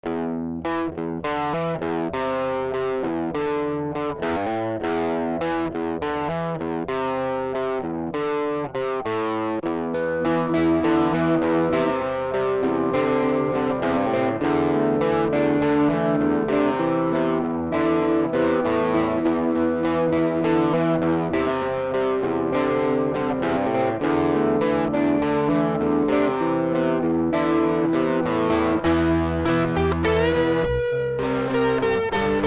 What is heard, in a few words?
pass the guitar